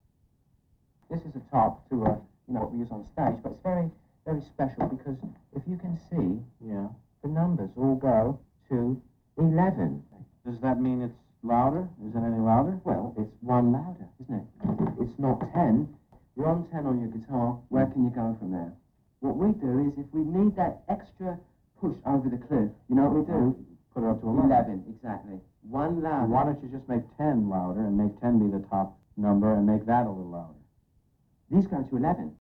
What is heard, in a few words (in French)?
Punk Triso Trash intro